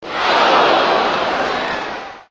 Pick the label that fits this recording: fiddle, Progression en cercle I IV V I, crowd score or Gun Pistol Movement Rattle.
crowd score